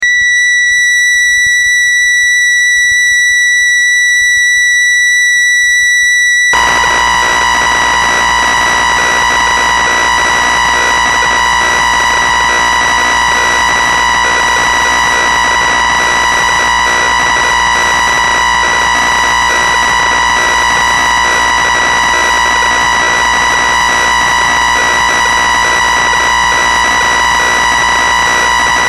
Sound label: akai vx90 factory